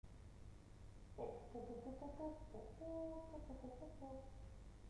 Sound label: basson